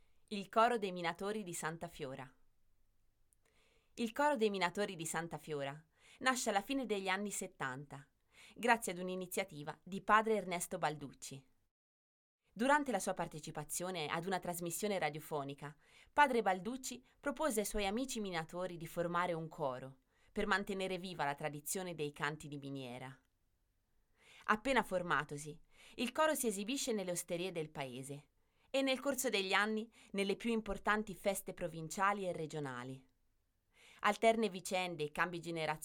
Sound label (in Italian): il coro dei minatori; di santa fiora